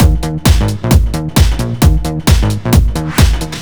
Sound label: Techno Groove Full, 132bpm; Zero-G; Soundclash; Combi Loops; Nu Dance Electro